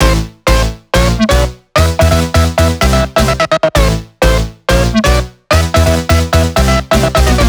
128BPM Melody; Vengeance Samples; Fatman Kit

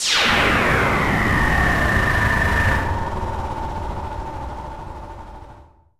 Downlifter

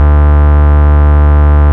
Bass D, Root D

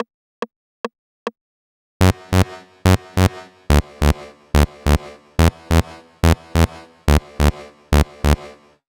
Bass Verse, 142 BPM; Vengeance Samples; Hello You

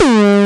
BD Distortion, Bassdrums; Vengeance Samples

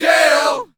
Vocal Oneshots; Vengeance Samples; Vocals

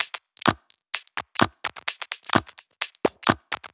Drumloops 128BPM

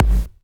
Bassdrums Tekhouse; Kicks; Vengeance Samples

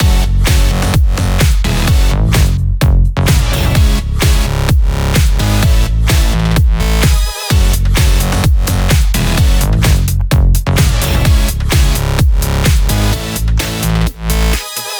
128BPM Mix; Vengeance Samples; Sunday Kit

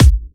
Hard Kick; Vengeance Samples

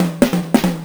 Loops Fills; Vengeance Samples

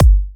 Bassdrums; Vengeance Samples

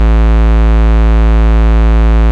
Bass Root A

